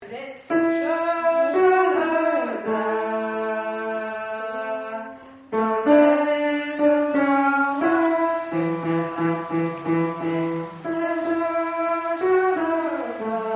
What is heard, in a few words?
tenor